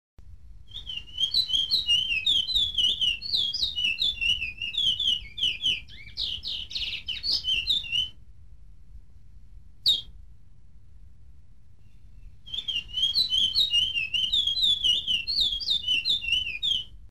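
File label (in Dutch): Picolet Pijepije, Surinaamse Zangvogels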